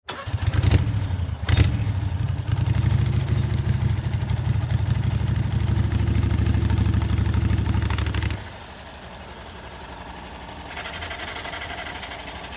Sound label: Boat Fire Pump Run, On, Run, Off, Exterior, Perspective; Transportation, Watercraft; Operate, Police, Marine, Division, Cherokee; BlastWave